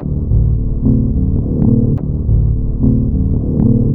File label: vroom